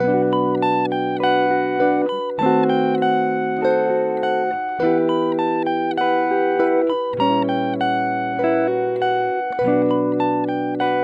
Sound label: 100 BPM Bm